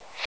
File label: swipe